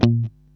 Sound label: A#2, funky guitar